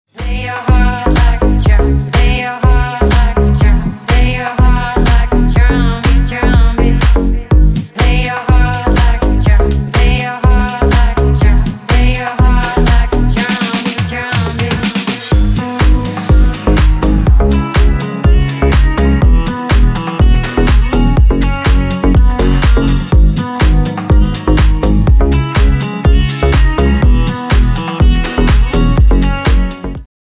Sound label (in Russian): РИНГТОН